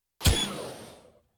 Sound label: whompy pneumatic blasts short bursts tubular